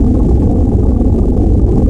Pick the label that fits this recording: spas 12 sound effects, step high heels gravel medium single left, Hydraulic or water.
water